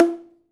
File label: Prepared Drums